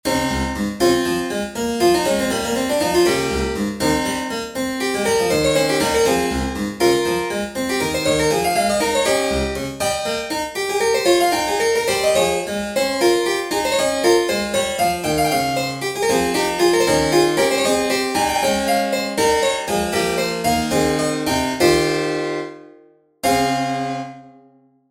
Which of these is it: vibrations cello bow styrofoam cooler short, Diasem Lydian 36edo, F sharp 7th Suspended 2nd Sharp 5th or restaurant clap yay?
Diasem Lydian 36edo